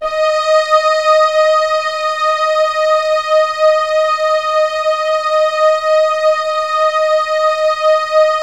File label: Keypad; Standard Instr; Keypad 2